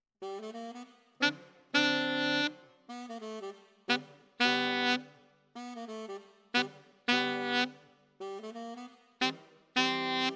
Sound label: Alto, RNR for piano